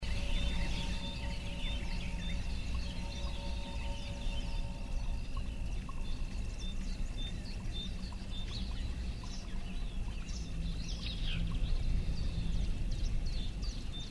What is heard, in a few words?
Atmos Outdoor Castle